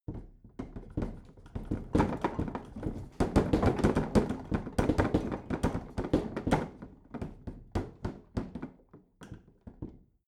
bathroom cabinet rattle; objekte; tueren

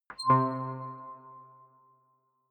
click tonal reverb

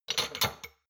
shot glasses grab multiple soft, gastronomie; soundpool

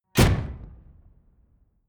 sci-fi ricochet metal; schusswaffen

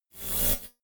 notification noise swell double short; kommunikation; signale

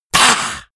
monster hiss; koerpergeraeusche; comedy-cartoon; soundpool

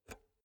pull out xlr multi socket soft; objekte; elektronik

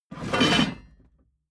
metal scrape wood hard

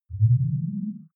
game start deep, signale

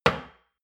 shot glass place table hard, gastronomie; berufe; soundpool

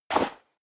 step high heels sand jump; schritte

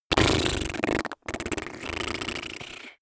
blow lips; koerpergeraeusche; menschen; soundpool

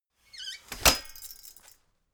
folding chair unfold medium; objekte; stuhl

